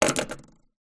leisure toy fidget spinner metal down on solid wood table; zapsplat